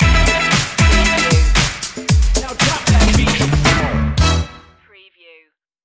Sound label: shake n break stinger